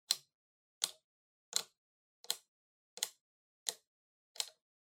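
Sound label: Soroban Upper Minus, abacus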